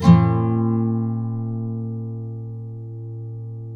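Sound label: nylon up minor B, guitars, flam chords; Spectrasonics; Hans Zimmer Guitars VOL-2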